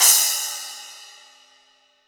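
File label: CYMBAL, real drum kit; HIPHOP KIT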